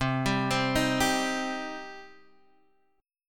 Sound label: C Minor, C, Guitar, Half Step Down